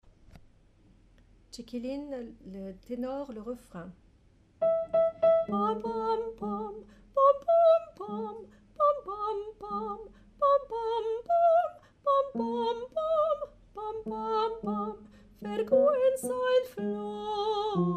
Tenor

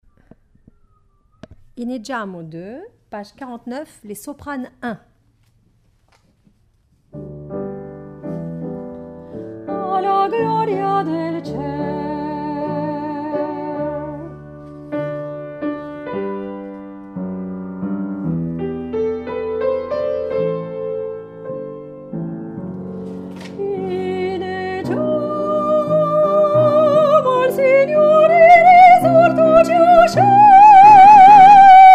Soprano

